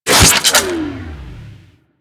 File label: Microwave Pistol Stop; Grineer